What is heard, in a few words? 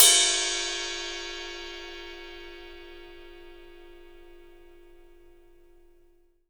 ride cymbal; 3D Sound; 3D Drums